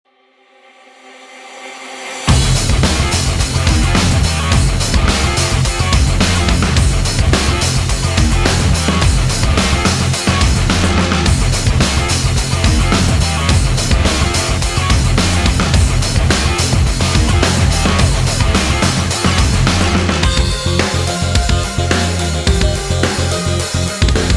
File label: Intense Digital Rock Theme